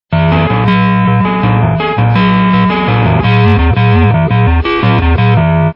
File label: ring